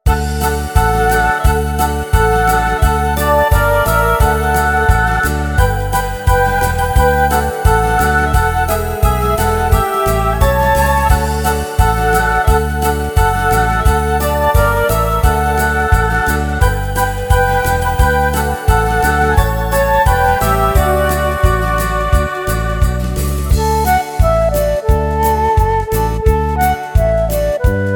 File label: Jingle Style